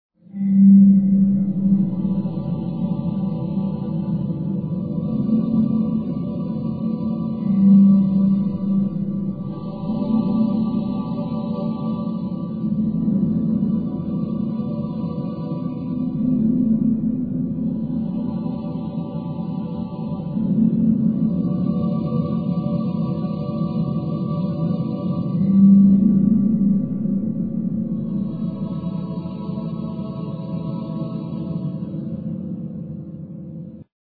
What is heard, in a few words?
drone